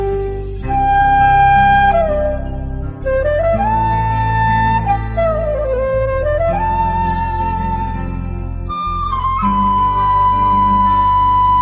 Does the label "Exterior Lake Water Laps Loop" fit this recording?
No